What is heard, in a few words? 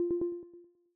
Error